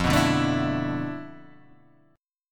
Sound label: D Minor 6th Add 9th F, guitar chord